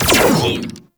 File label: Burst Laser Pistol Prime Fire; DE; Warframe; Weapons; Sentinel